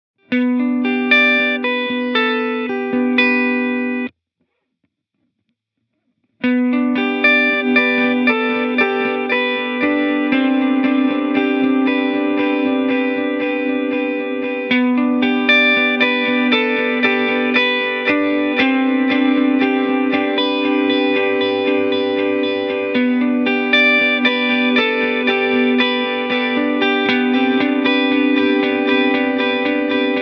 Digital Dynamic Duck

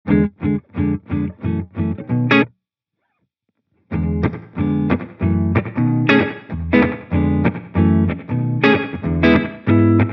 Tape Space Echo

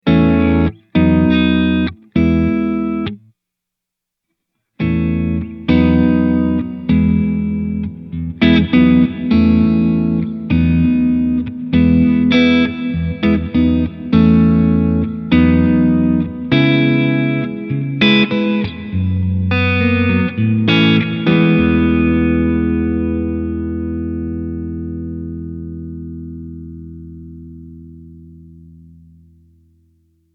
Hall Modern Long, reverb

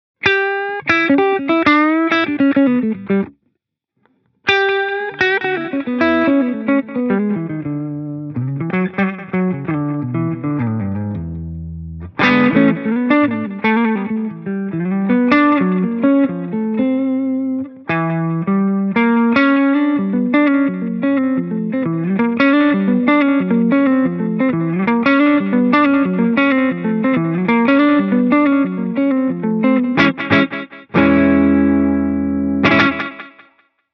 Digital Ping Pong

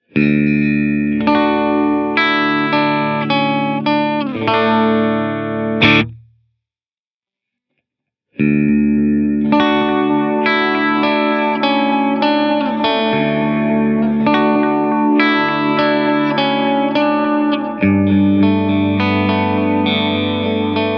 Analog Tube BBD, echosystem

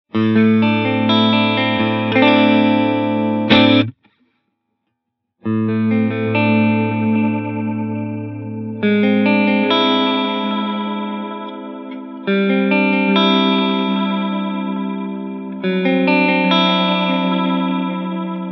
Analog Deluxe Memory Boy

